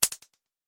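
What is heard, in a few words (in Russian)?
щелчок резинки с отскоком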